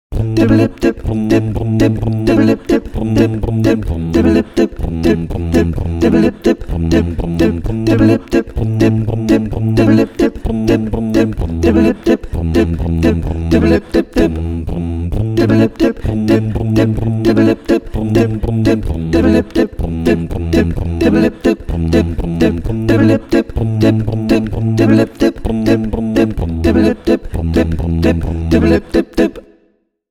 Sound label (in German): Refrain Karaoke